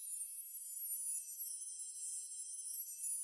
Pixie

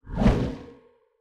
glaive impact ghost

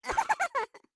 death

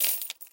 Coins

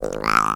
Zombie

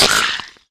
NPC Killed

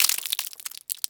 NPC Killed

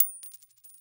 Coin

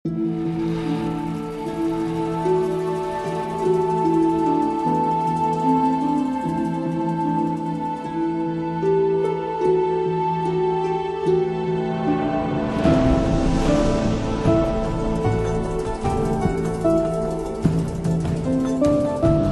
Music Jungle (Otherworldly)

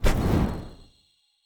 phantom phoenix shot

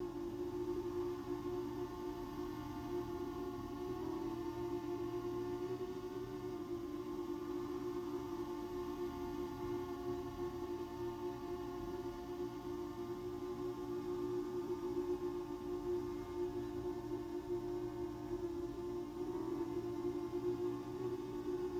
Drone

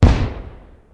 NPC Killed

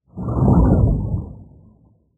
NPC Killed

